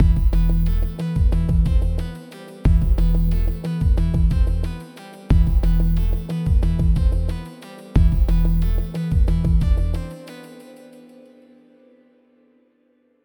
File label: bass; one dog story